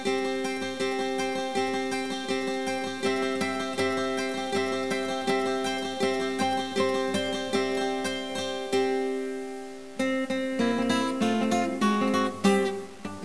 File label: jingle